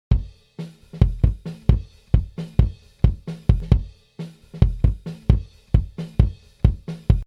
Bd roh

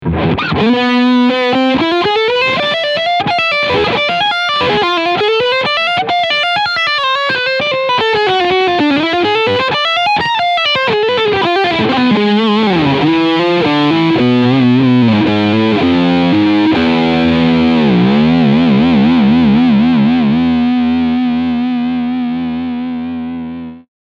Leadsound Steg PU

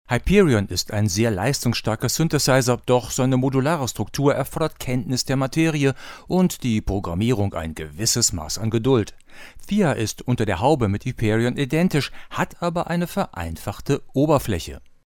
Monkey Banana Mangabey Niere vorne

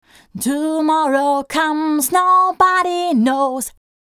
Dynamics Gesang

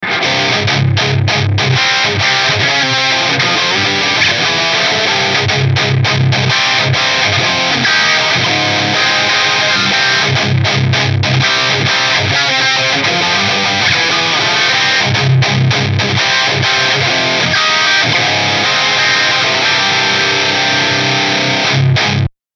DJent Riff Steh PU

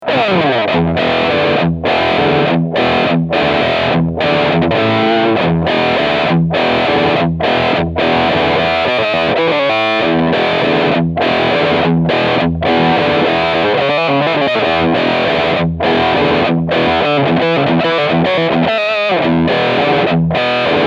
Filtersweep 15 Uhr

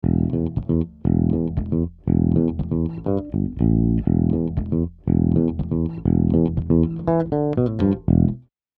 Lang PEQ 2 E Bass ohne EQ

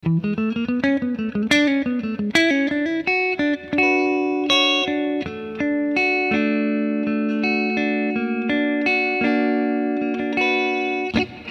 Clean Picking SC Modus

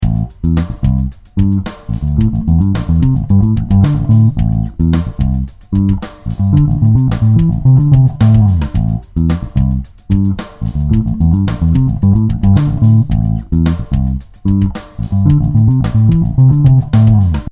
viking stellung 3; hagestrom

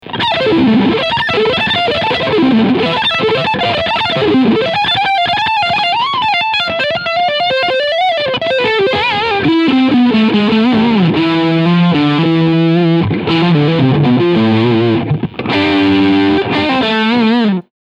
Distortion SC Mitte

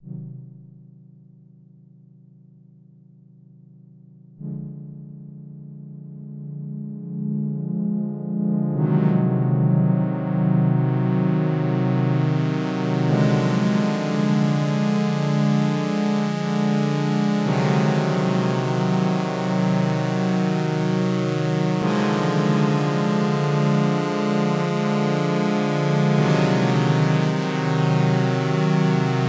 OB6 P6 Vergleich Saw Filter 0 127

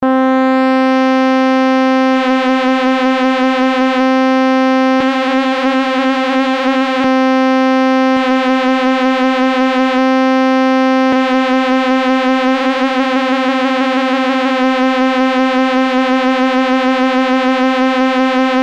Klangbeispiel Gamma die 6 Wellen

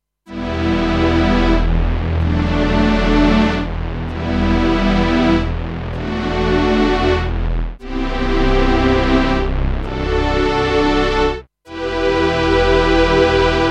Pad Release Time 200 ms minus 0 prozent gleich linear Phrase

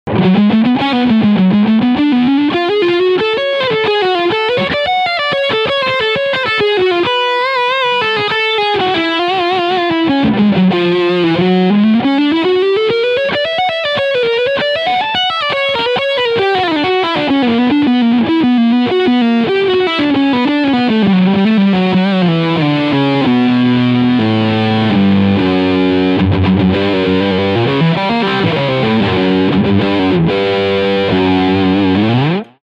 PRS CE 24 Lead Sound Steg Pickup; Dustie Waring

